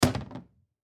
Cupboard Door Close, Cupboard Door Shut Rattle Short Close; Door Closing; Household; Blastwave